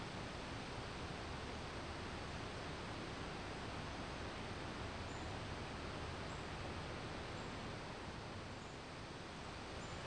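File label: tree sway wind loop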